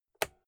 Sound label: old switch plastic robust